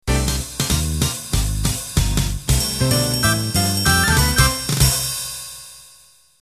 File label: Ballroom Swing End